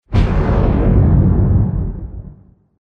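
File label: cinema transition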